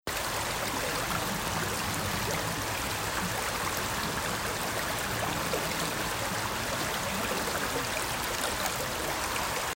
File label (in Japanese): seseragi; 花巻市